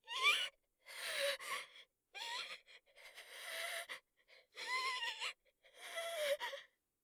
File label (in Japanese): 震えて吸って吐く息; 効果音なし